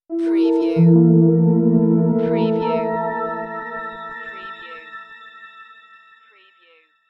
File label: Scifi Audio Logo Ident, Mysterious, Droplets, Dissolve